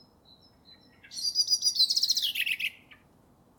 merle noir cri

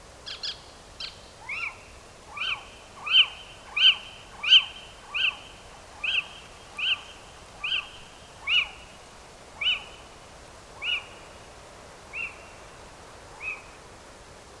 chevêche d'athéna cri

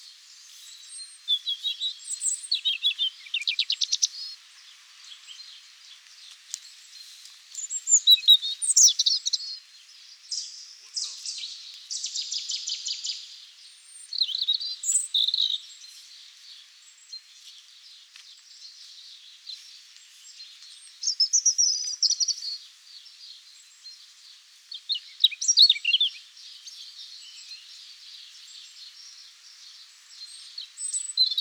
rougegorge familier chant

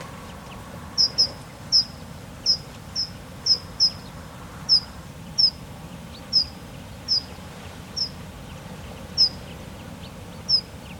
accenteur mouchet cri

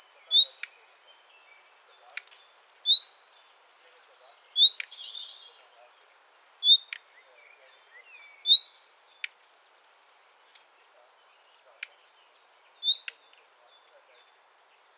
rossignol philomèle cri

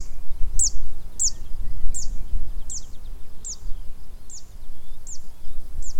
cisticole des joncs chant

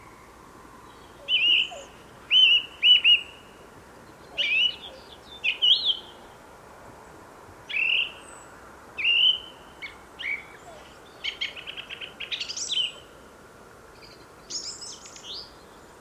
grive musicienne chant

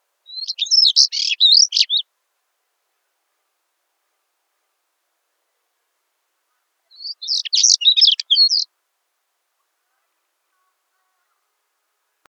tarier pâtre chant

